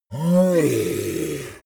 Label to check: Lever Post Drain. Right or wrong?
Wrong